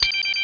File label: Set Power Level; XWing